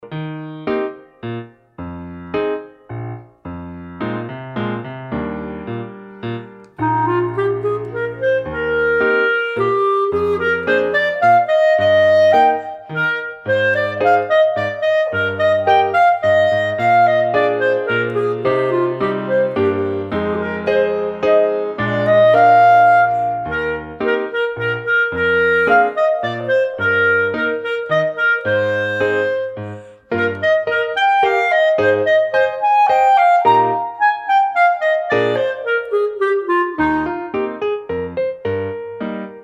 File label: Clar, Le bal des jouets